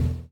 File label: stop, vehicles; aventador